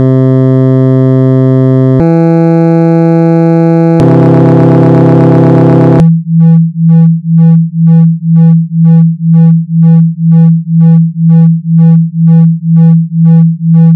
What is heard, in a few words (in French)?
tierces